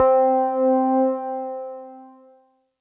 Synth Prophet One Shots, Keys C; Electronisounds

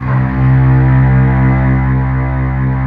C2, mellotron

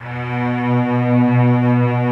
COMBO STRINGS; OMI - Universe of Sounds

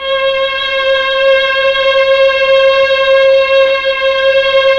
STR, Melo.Strings, Slow Strings; Roland LCDP09 Keys of the 60s and 70s 1

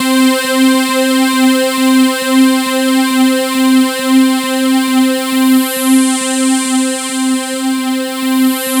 C4, Synth Line; 90 s Sample CDs; NiceBeats; SynthLine VOL-2

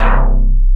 BASS R, Drum & Bass 160 BPM; Ueberschall; Drum & Bass Flash Trackz

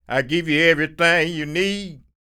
SING, Blues Soul; Spectrasonics; Vocal Planet

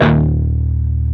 PRS PWR, Guitars; PRS PWR CUTS

